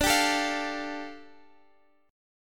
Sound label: A 7th Suspended 4th Sharp 5th, D